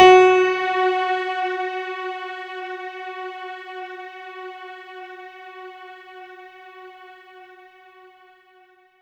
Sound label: F#4, synth; 90 s Sample CDs; NiceBeats; SynthLine VOL-23